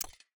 ui click cancel

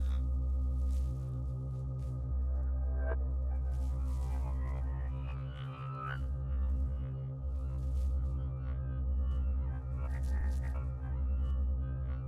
Conductor Crystal Idle; TANK; 3Souls

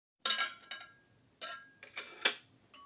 ambience room butchery working layer

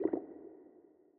zone gas blop

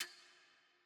ambience room metal base

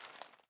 ambience room shadow room working layer

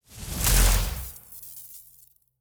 Conductor Crystal Start Working; TANK; 3Souls

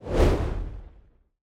arena spell draw start

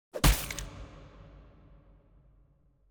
ambience room torture gulliotine cut

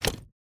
submenu corridor open

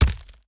Bone Golem Step; TANK; 3Souls

